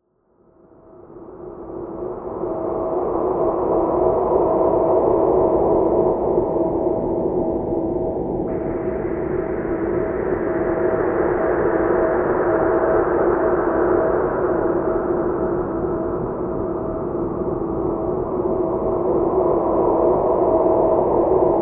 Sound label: Ambience; Black Mesa Re-Engineered